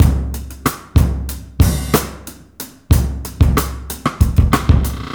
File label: DRM, Breakbeat; Best Service ProSamples vol.24; AKAI; BETSYS